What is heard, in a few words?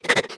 breath; Scrat